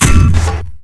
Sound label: grenade; weapons